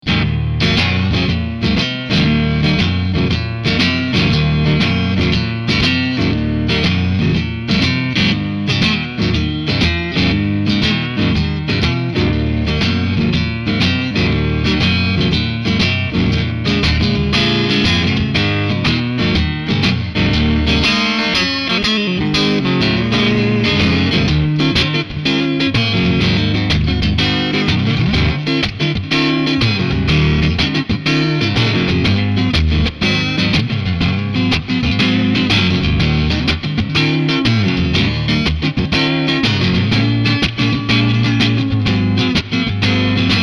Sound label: Blues; iRig UA